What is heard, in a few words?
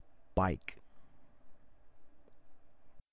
Bike; transportacion